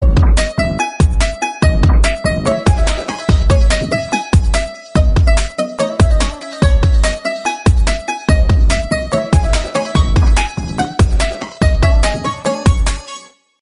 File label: cool hip hop background music ringtones